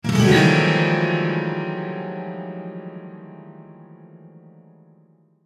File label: player detected piano flail; dishonored